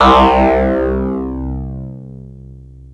SCRUF BASS C3, Yamaha TX-81z

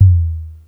floortom, Kawai SX; synths

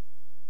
noise shape, Chroma Polaris; Rhodes

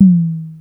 med tom, Kawai SX synths

